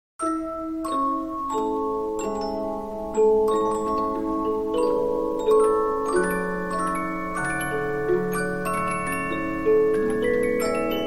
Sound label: Polyphon, 15inch disc musicbox